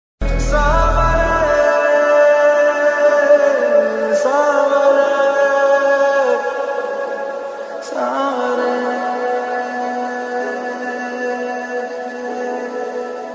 romantic love ringtone Hindi love ringtone new song ringtone